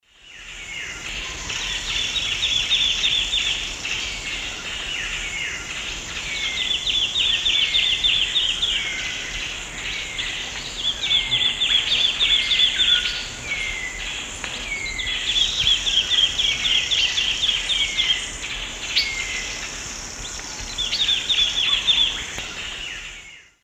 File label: Hylophilus thoracicus, song; S. America; Eastern Lowlands